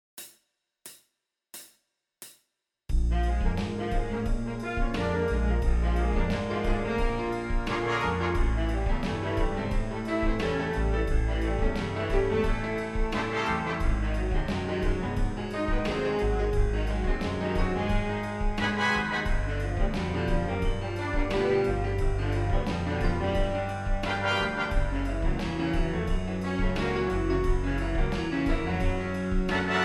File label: Lip Slur